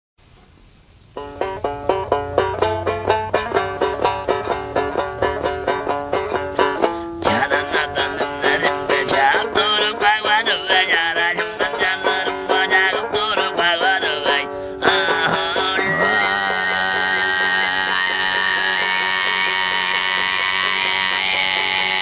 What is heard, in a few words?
finnish national anthem